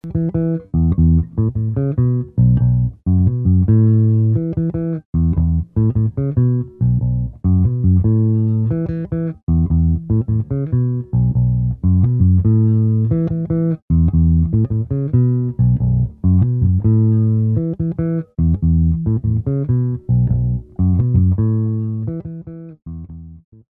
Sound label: EBS allround